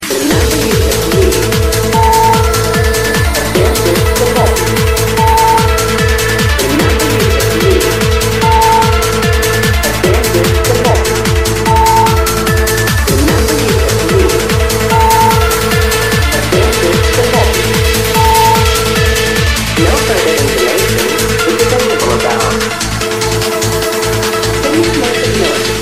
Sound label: Trance